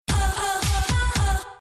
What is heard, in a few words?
T-Mobile sms ringtone